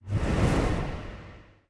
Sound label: body swish; smite; thanatos